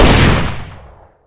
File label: shoot; russian sounds